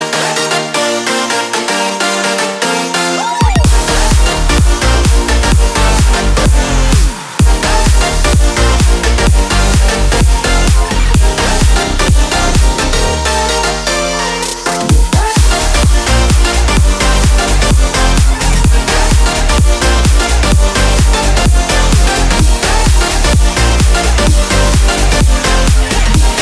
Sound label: electro